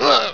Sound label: pain; wanted